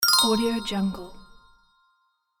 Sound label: news time; soundmasterfx